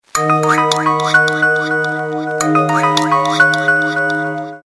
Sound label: Fast message